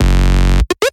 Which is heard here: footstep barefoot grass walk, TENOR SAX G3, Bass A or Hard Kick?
Bass A